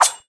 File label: throw, shuriken; weapons; garrysmod